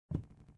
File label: walk wood; Out of Reach: Treasure Royale